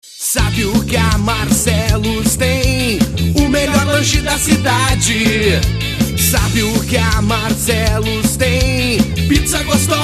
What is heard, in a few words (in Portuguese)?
jingle; Marcelo's Lanches; Estúdio Gavetão